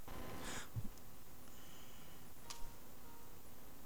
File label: Lead Vox